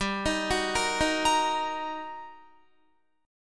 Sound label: G Minor Major 7th Sharp 5th, G, down, Guitar, 4E 4A 5D 5G 5C 6F; GmM7#5; arpeggiated